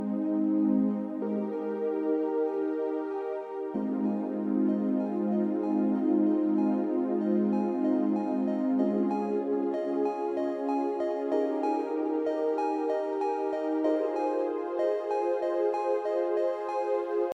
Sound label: soft ringtone; अंग्रेज़ी